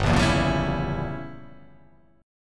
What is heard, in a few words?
G Diminished 7th; 3,1,2,3,1,2,3,1; down